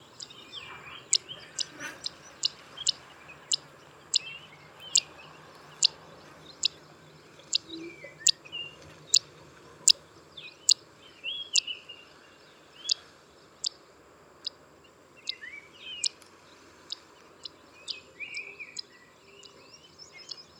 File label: Zitting Cisticola Cisticola juncidis